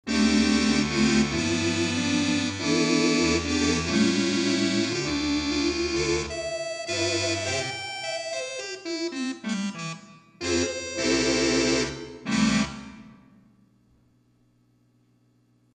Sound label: 8' Tibia 8' Posthorn, theatre organ